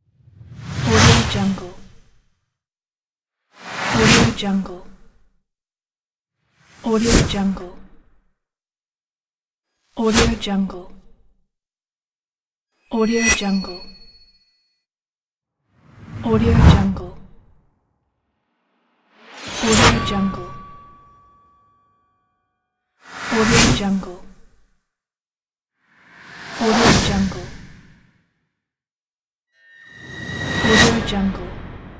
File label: epic trailer whoosh